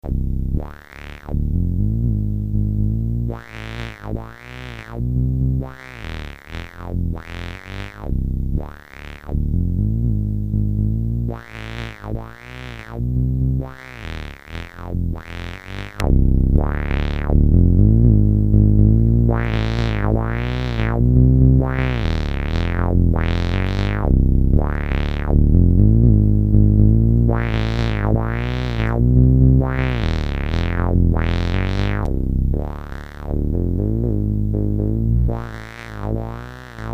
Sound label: Bass; Deformer